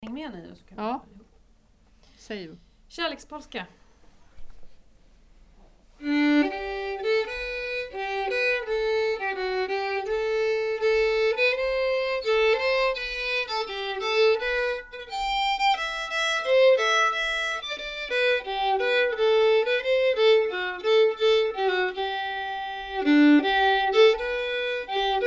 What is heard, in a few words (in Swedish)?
Kärlekspolska